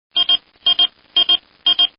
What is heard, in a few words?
pager